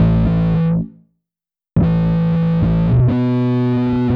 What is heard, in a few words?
BASS